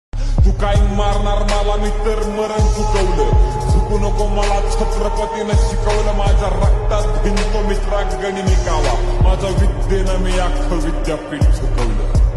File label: lofi song; chatrapati shivaji maharaj; viral song